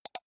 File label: computer mouse left click single fast